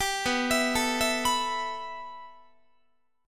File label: B 7th Suspended 4th Sharp 5th B down Guitar; B7sus4#5; arpeggiated